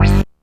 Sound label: Synth Hit G; Zero-G Trance Inducer; 90 s Sample CDs